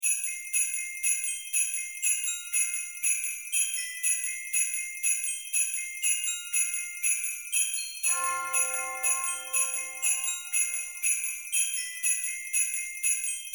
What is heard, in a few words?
Bright Christmas Bells And Chimes Loop; Musical Sound Effects